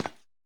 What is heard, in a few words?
Footstep Wires